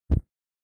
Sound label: Lighter Flame